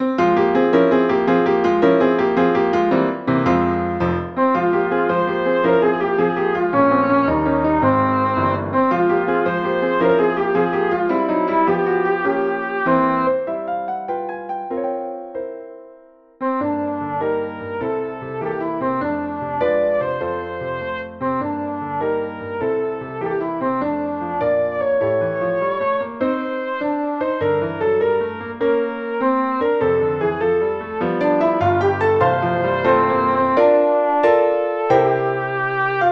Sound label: Ocean lullaby for cor anglais; piano; synth